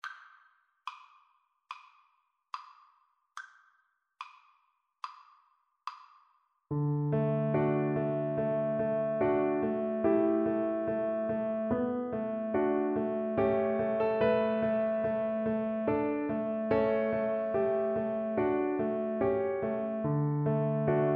DB, Double Bass

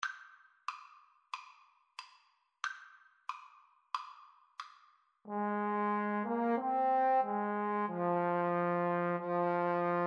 Trombone Duet

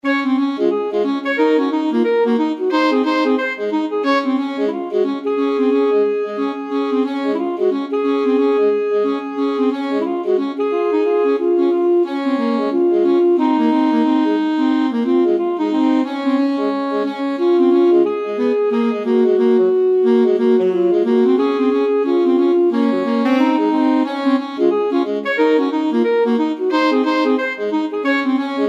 when the saints alto saxophone duet

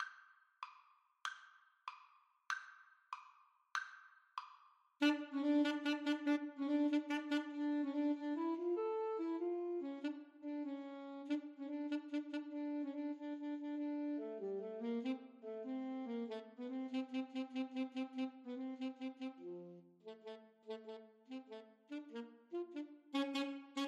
Alto Saxophone Duet

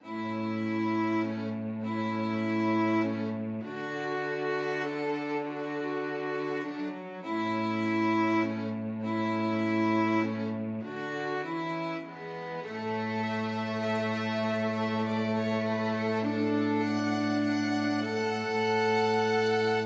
lavenders blue, string ensemble

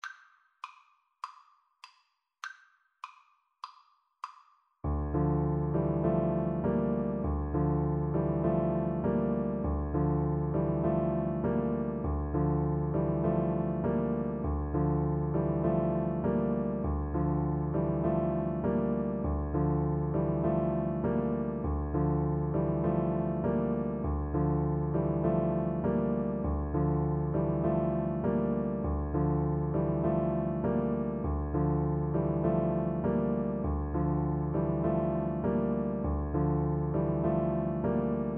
trumpet

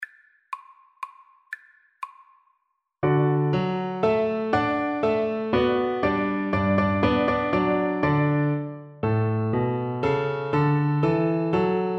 German Dance, Cello; K605

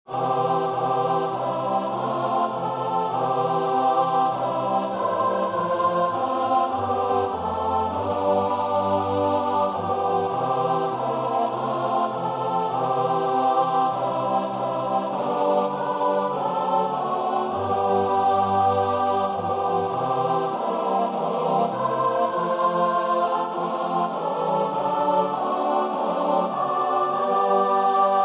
lead on king choir

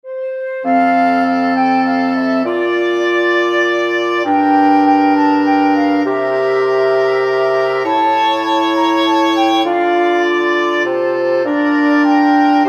we wish you a merry christmas, wind quartet

